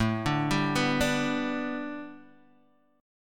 A 9th Suspended 4th Guitar DADGAD, chord

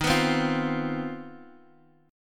C Minor Major 11th Eb; CmM11/Eb strummed; JGuitar

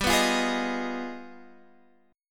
G 7th Flat 9th Sharp 5th, chord

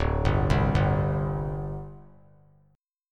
D 7th Suspended 4th, down; arpeggiated; JGuitar

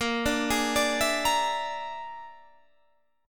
Bb 7th Flat 5th Bb, Guitar Standard; Bb7b5; arpeggiated; JGuitar

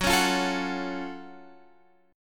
F sharp Minor Major 7th F sharp Guitar Open F; strummed; x,13,13,12,12,13

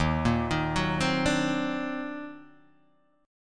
Eb Minor Major 13th, Guitar, Open D

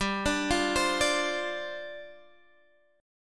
G 7th Suspended 4th G down Guitar Standard; G7sus4 arpeggiated

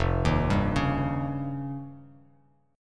F 7th Suspended 2nd Sharp 5th F Bass Standard; F7sus2#5 arpeggiated; 1,6,5,6

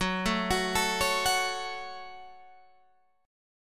F sharp Minor F sharp, Guitar Open G

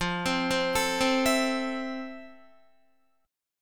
F Major 7th Guitar; arpeggiated; JGuitar

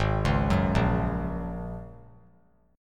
Ab 7th Suspended 2nd Suspended 4th, 3C,3F,3A,3D; Ab7sus2sus4 arpeggiated

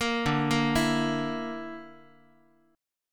D Suspended 2nd Sharp 5th D, Guitar; Dsus2#5; arpeggiated; JGuitar

